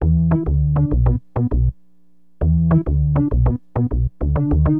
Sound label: SYN BAS, Acid Jazz Funk